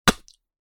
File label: Wooden cup Cheers, alcoholic drink